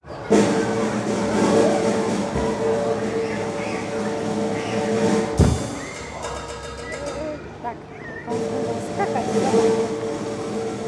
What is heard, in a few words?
Circus Jump Snareroll